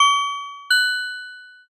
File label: shop enter